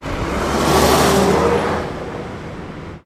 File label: Departure Of Old Ikarus Passing By